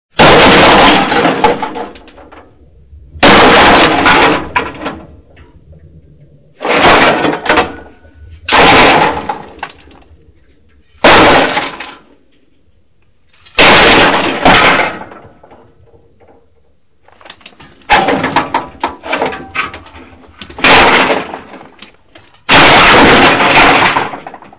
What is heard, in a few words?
crash